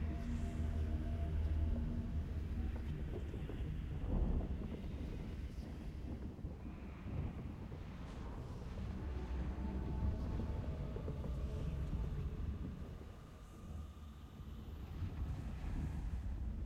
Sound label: amb building; shadowisles